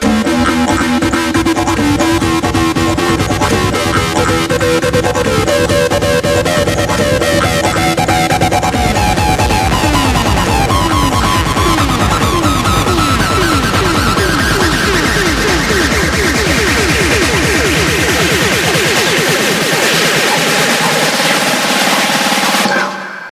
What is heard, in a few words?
High Techno Tone